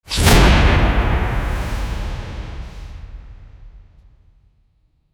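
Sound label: monster bursting from light, magical energy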